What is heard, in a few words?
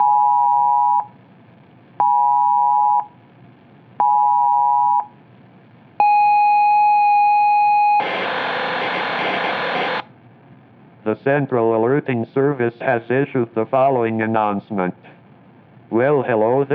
emergency Alert Example